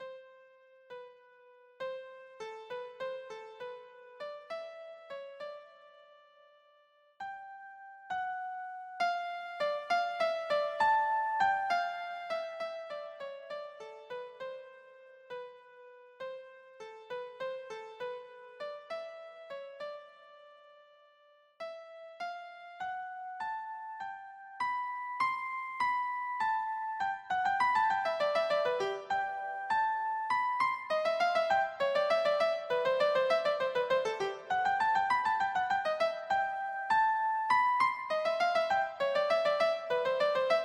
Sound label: tchaikovsky waltz, keyboard